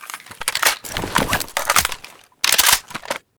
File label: ak74u reload empty; STALKER; IWP